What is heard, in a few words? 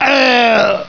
pain